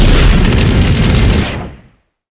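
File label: fire gecko flame loop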